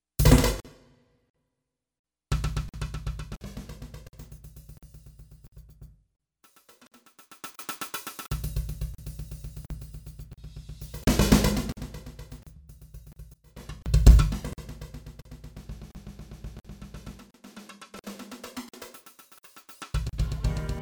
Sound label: New Year Drum Flourish